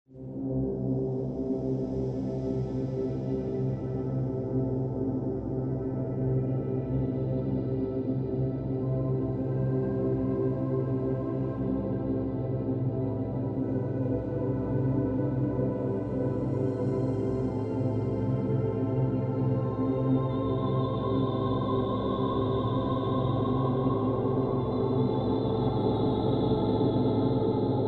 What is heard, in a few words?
Amb Sound Scape Soprano voice, Indian Flute, airy ambience; Musical; Ambient SoundScapes